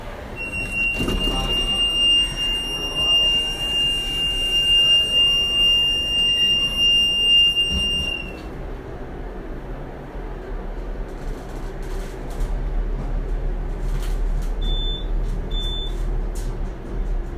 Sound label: Sound Map; Diana Salcedo